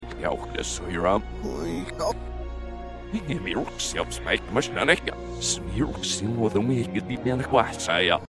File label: screams